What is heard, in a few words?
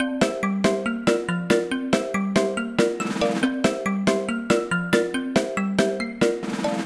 bgm matching; game rip; line bubble